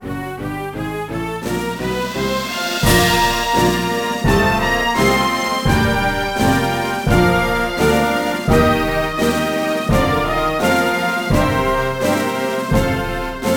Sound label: BGM ORCHESTRA